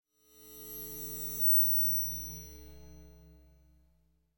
Cymbal Bowed Metallic Tone Sharp High Scary Element; Hollywood Edge; sound designer's toolkit v2